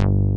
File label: OBC BASS; Pro-Rec - Synthsonic; BASSES